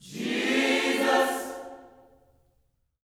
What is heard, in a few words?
JESUS, Gospel; Spectrasonics; Vocal Planet; GOSP CHORD